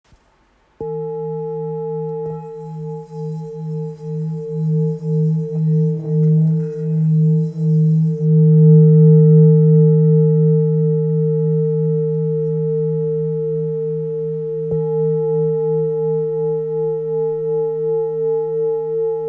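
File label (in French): Full moon mi, bols chantants tibétains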